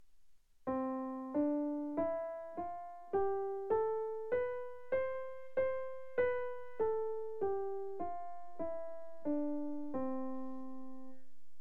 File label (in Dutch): Toonladder in C - Equal Temperment